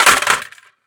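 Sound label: rifle drop concrete; STALKER; IWP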